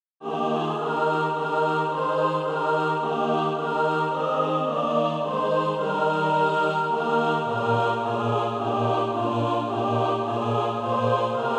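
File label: mixed, Practice Music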